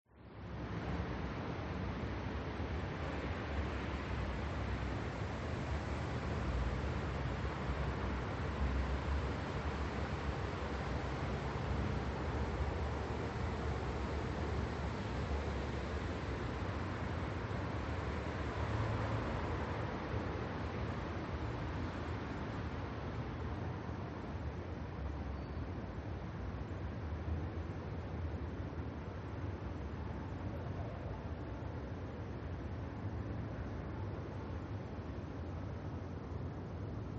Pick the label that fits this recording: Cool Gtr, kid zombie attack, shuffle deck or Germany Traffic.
Germany Traffic